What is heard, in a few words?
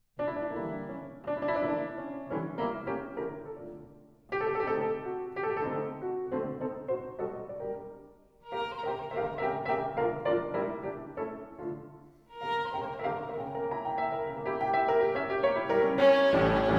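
violin sonata; gade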